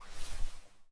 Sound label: Grenade Over Release; zps; Weapons; Explosives